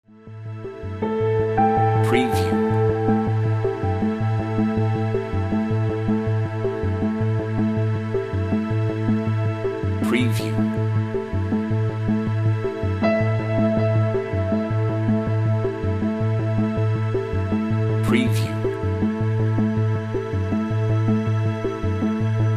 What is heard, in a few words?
dark tense interlude